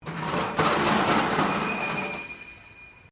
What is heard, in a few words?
METAL BIN SCRAPE WIRE FENCE; Materials